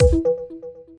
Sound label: Button Click; Britney's Dance Beat; GBA; PS2